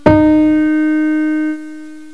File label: PIANO NOTE